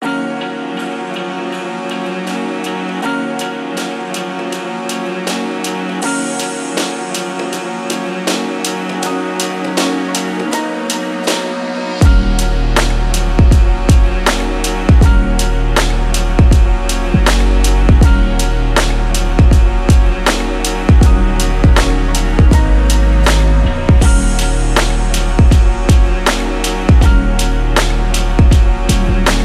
soul melody

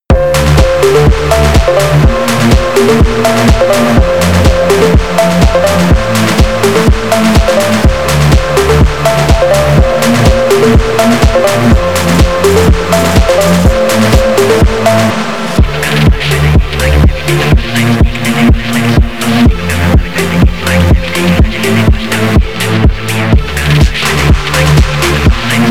russian phonk

